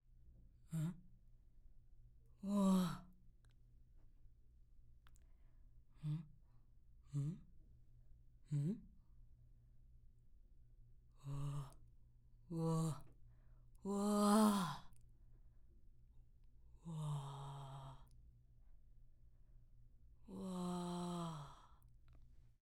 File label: Murmur Surprise Man